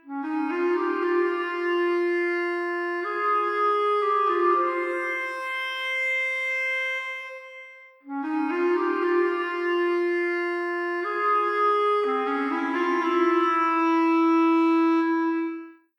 Clarinet; Toki Tori 2

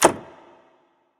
Office Door End Close

